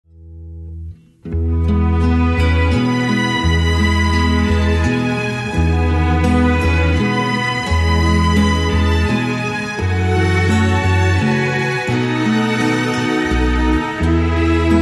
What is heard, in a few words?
Stille Nacht instrumental